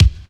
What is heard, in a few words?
good kick drum sound C key